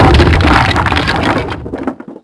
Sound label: Destroy